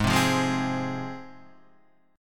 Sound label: Ab 6th Ab Guitar Standard, Ab6 chord; strummed; JGuitar